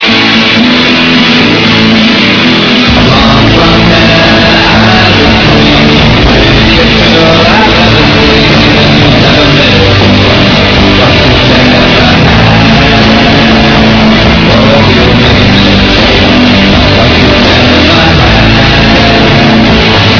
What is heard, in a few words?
slushy